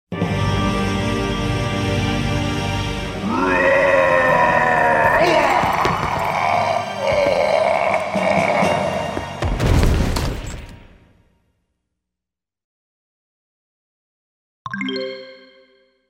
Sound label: Sound Effect - Giant Dies; Mystara; TSR 2513 Mark of Amber